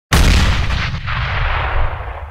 tboom

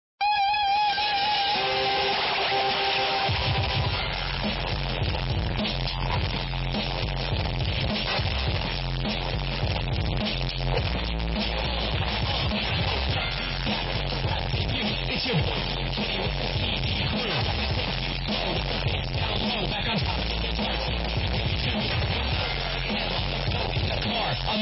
gangster rap bass boosted

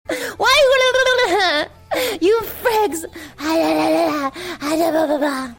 blelele lalala

